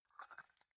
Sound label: dumbbell turn nut in, fitnessstudio